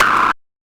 xilo; surfactant

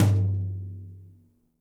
gretsch brush lo tom; Dirt-Samples

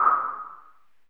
wind

